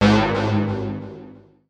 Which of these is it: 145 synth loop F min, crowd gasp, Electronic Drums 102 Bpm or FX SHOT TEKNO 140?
FX SHOT TEKNO 140